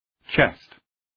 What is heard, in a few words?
chest